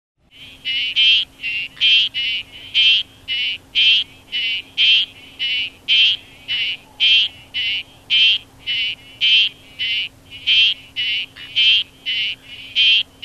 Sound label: Frog Squelching Crinia